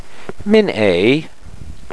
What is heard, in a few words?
caw; Mihn-ay'; You're welcome; Min ay